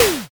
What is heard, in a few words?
water balloon